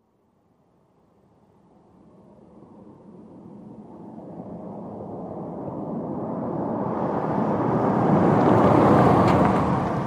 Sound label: Sm Car In Med Off, compact